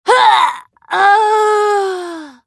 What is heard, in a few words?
female pain death; firefight